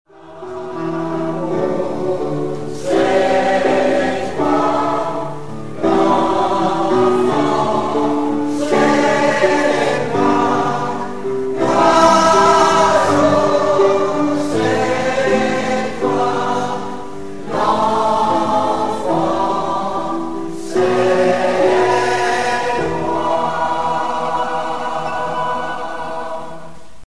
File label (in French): enfant